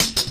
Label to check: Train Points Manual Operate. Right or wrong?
Wrong